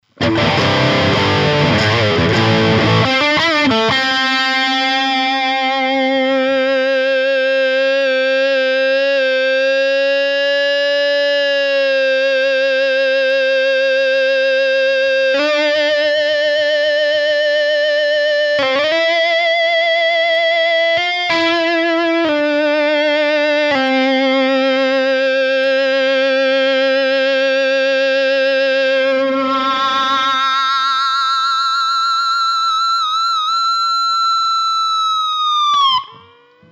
gain bridge mit sustainer ende mit oberton zuschaltung; fernandes retrorocket pro

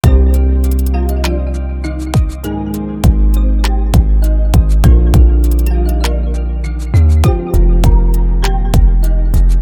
beat mit rnb 808; 808 Producing Guide

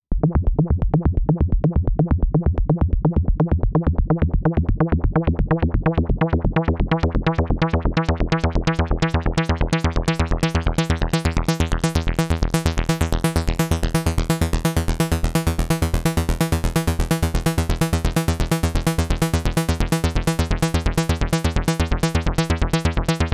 filterfahrt, Novation Bass Station 2

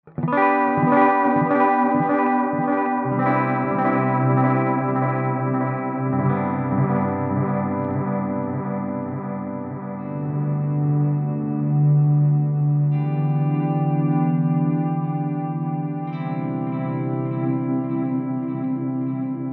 clean mit delay und reverb; Gitarreneinsteiger; Einsteiger Sets

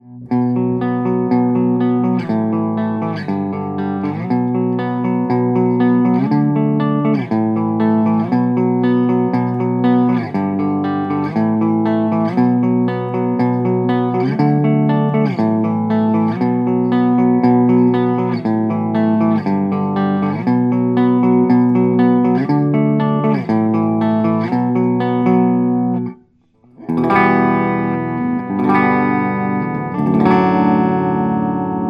gitarre clean; rode k2

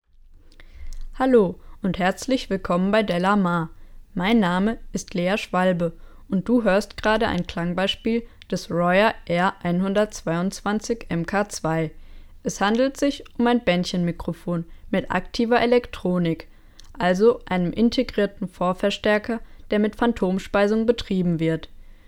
vocals Vorderseite 30 cm; royer r-122 mkii

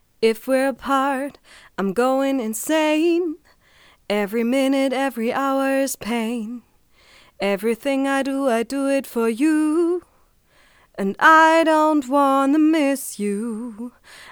t.bone SC 300 test gesang

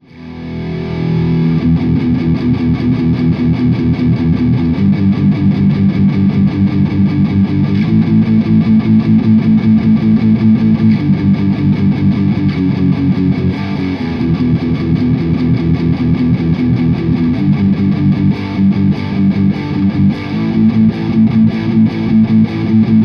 gitarre verzerrt; rode k2

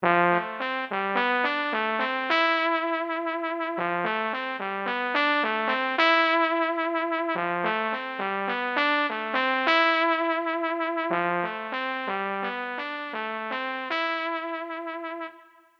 trompete, Startone MK-300

